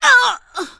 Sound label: Pain, elven female